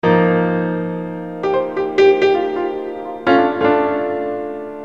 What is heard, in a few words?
piano